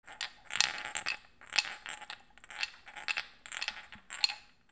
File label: FALLING